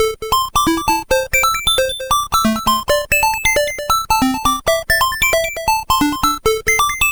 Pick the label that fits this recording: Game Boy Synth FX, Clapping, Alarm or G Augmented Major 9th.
Game Boy Synth FX